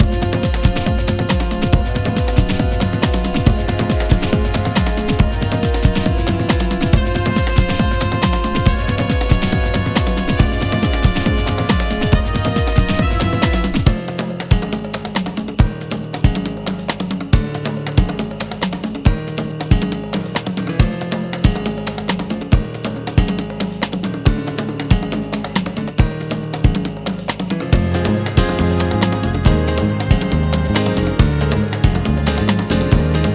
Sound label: Instrumental; Walt Disney's The Jungle Book Rhythm n Groove; PS1; PS2